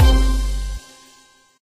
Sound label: appear bonus icon